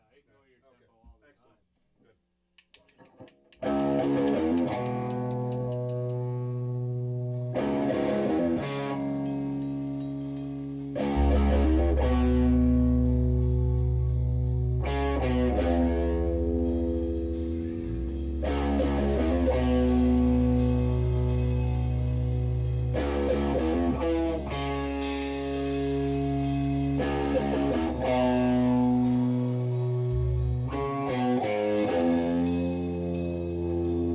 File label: Dial-o-matic riff slicer